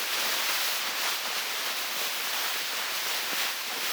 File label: eraser loop; props